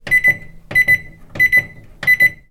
household microwave oven programme buttons press x4